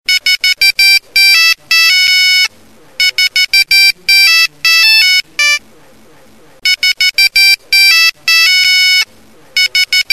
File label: Nokia Tune; Coca Cola